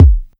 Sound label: smooth kick drum g key